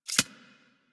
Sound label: Arachnoid Micro Step; Warframe; Corpus